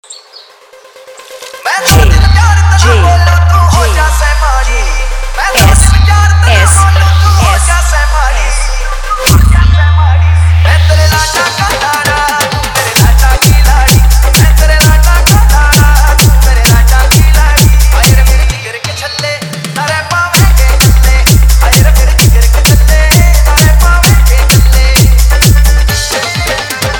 Blast Edm Remix Song

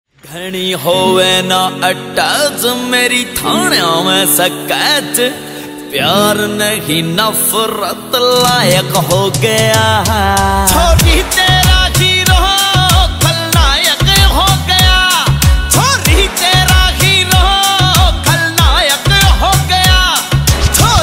New Haryanvi Dj Song

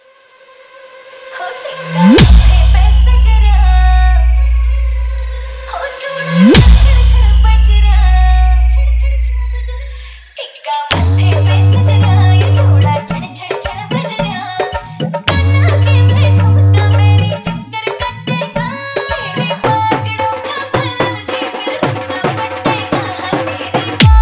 Dj Remix Hard Bass Haryanvi Song

